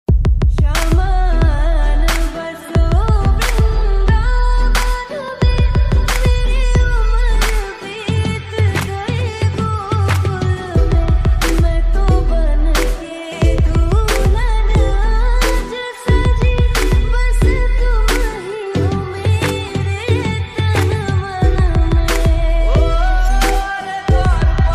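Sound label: krishna flute ringtone; shree radha rani; krishna basuri; radhakrishna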